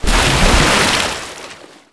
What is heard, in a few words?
Hit water Splash